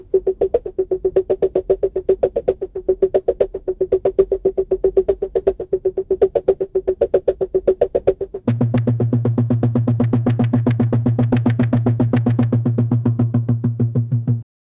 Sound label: trette treet teet teeeeee, drum